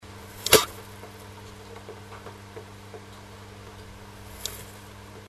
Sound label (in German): meise schnappen, nistkasten